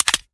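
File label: pistol reload mags mag out; gmod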